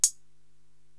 HHat Closed, Drum Machines; Korg TR-Rack

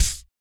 VOC PERC, Vocal Planet Jazz FX BASS PERC

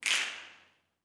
SNAPS, Gospel; Vocal Planet Vol1 Gospel; CLAPS+SNAP

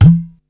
udo hi, Yamaha Motif ES drum machine; 300 Drum Machines; Yamaha Motif ES-Series

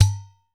udu, percussion; BRT UDU D; Voice Crystal - Steve Reid Definitive Percussion

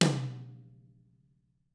TAMA, Drums

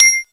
ETHNO BELL; SONIC ELEMNT; SONIC EL YES

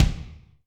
Kik Tiled RM, Drum Machines Alesis D4

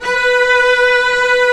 Vcs f, String Sections; Roland LCDP13 String Sections; STR Vcs2 f vbx5

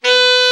Alto Sax; Sound & Vision - Gigapack II CD 1

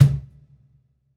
Cajun, Percussion One Shots; Essential Percussion

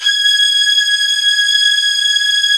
SPARCO VIOLIN

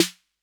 808 SNARE; Concrete Collage Drum Kit; Concrete-808

